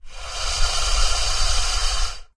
charge lizard

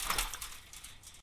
fence rattle

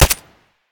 Glock17 SD, Guns Fire; Weapons

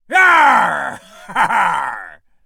charge, Emotes

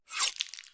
scalpel, Surgery; Shitmed; Medical

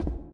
floor, Footsteps

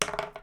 dice; civ13; civ14; Items

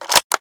arisaka close; Weapons; Guns

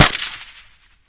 AKM SD, Guns Fire; Weapons; Civ

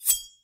unsheath

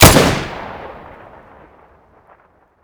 Springfield, Guns Fire; civ13; civ14; Weapons

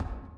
plating, Footsteps; civ13; civ14

